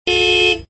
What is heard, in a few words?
Car Horn; Extra Lives